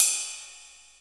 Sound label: jazz crash sample c sharp key